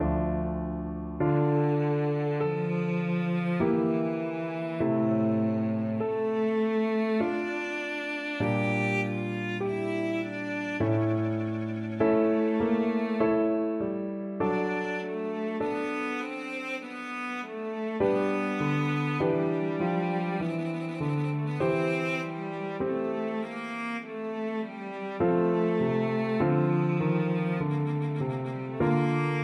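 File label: violoncello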